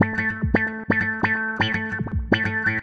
Wah Wah Gtr

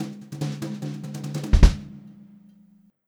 144 fill, Brushed Drum Loops; USB Soundscan vol.39; AKAI

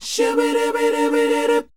SHOO B C, R&B Dance, vocal; Spectrasonics Vocal Planet

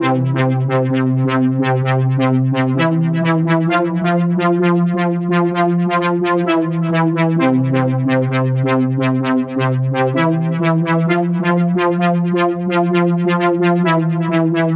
NRG Pad; Sonic Foundry (Sony Creative Software); Trance NRG VOL-1

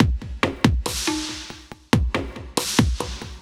SloMo Beats, Loops 140 BPM

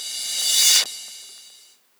Reverse Cymbal

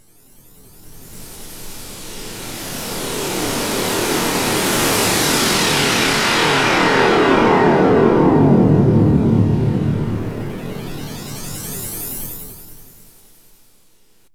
WHOOSHES; AMG Megabass REMIX! VOL-2

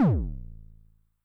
BD MOD [Pitch+Depth+Overdrive], 909mod, BASS DRUM; classic machines

